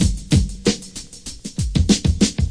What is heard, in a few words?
96 drag your feet, drum loops and breaks; 091 to 100 bpm